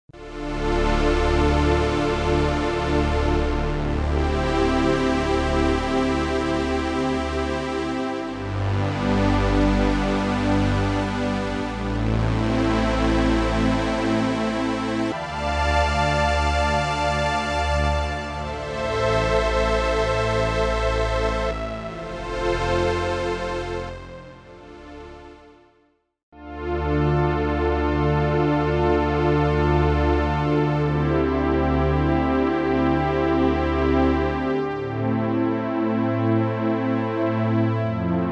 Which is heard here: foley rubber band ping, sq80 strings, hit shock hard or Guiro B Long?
sq80 strings